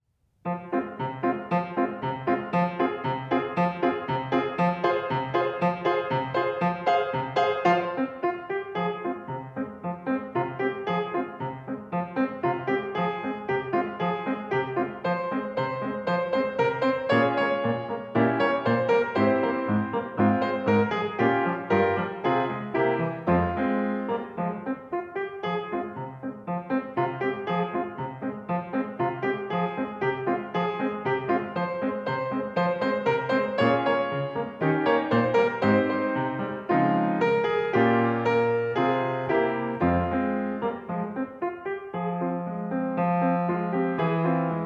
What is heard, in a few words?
piano